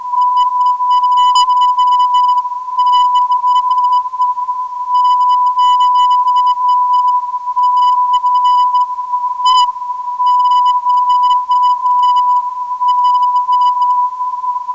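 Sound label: non-directional beacon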